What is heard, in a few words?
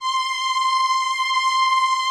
VP-330, String Machines